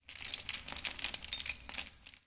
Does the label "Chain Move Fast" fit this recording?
Yes